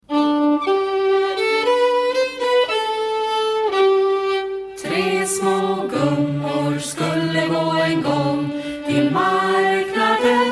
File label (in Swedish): musik